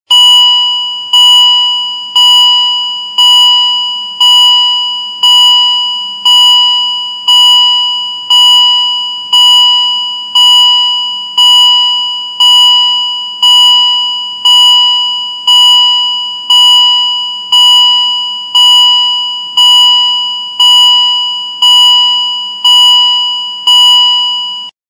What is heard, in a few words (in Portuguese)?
porta aberta alarme